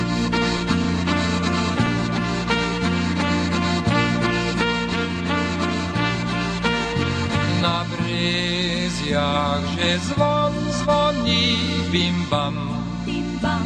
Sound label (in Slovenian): na brezjah zvon